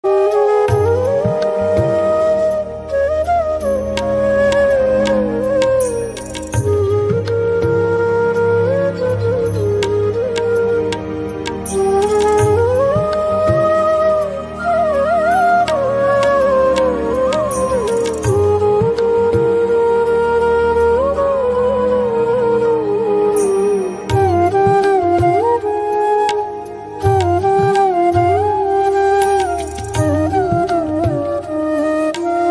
ringtone latest flute music relaxing music ringtone peaceful ringtone